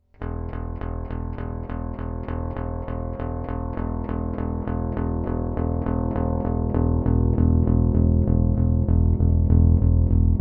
Bass Rickenbacker DI Signal Hi Mid Boost Stufenlos nach unten

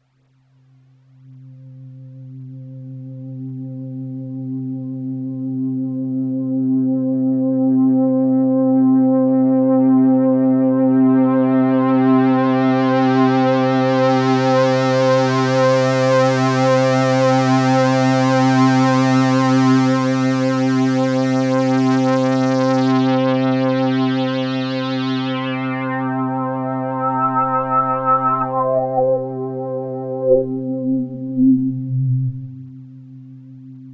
Roland JX03 Filterfahrt bis Resonanz zu Highpass

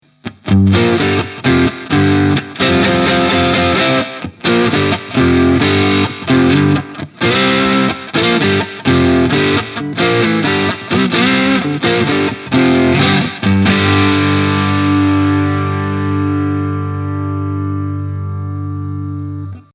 hals full crunch; Danelectro 59X

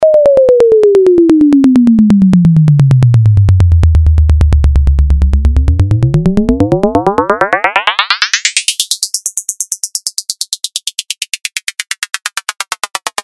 Sinuswelle und Automation

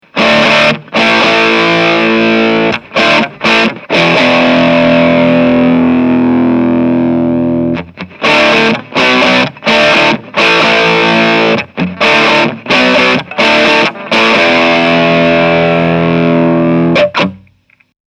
gain steg crunch; myPaula

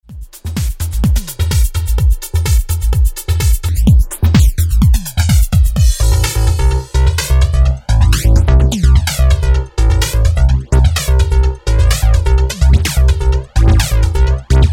Flanger diverse

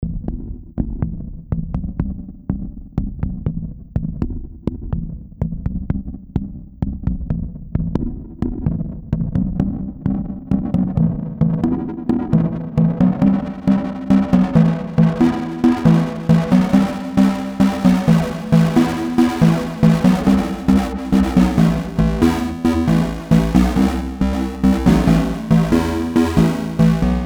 LFO Filterung Boost on dann off

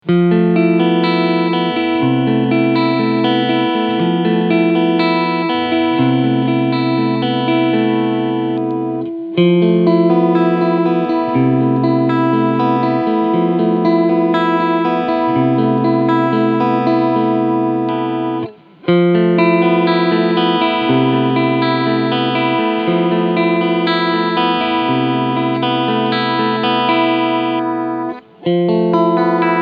Harley Benton BM-75 Cleansound alle PUs; Trans Red

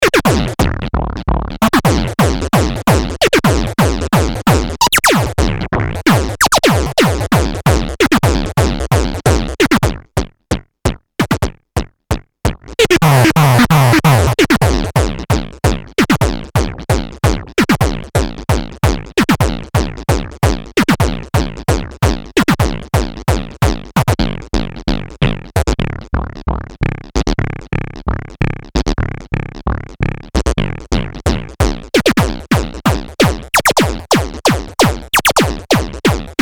Malekko Manther Waveshaper hart am Stampfen

